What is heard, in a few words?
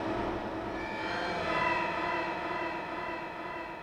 ethno 125